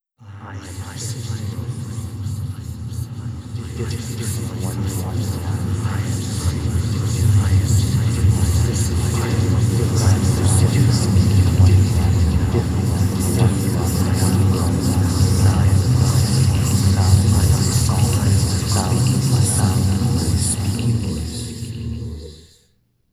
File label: Fire; Lucier